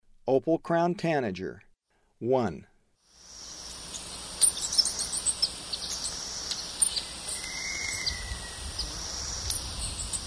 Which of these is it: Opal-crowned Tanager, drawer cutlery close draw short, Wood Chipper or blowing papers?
Opal-crowned Tanager